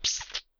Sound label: spark; qzdoom